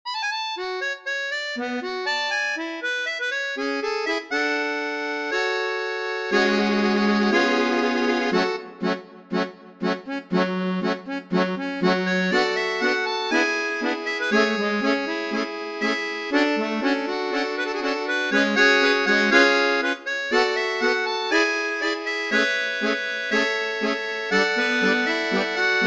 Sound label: tango, melodica